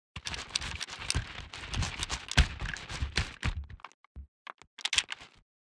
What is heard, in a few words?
Ranger movement run